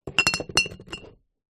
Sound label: foley glass bottle down on dirty table with others